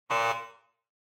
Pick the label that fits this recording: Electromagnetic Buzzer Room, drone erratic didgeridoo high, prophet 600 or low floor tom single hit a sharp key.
Electromagnetic Buzzer Room